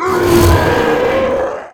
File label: Death